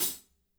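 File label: closed hi-hat, madness kit; Spectrasonics; Burning Grooves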